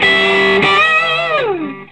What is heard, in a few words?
SOLO E, Vintage Blues Guitar; SOLO E060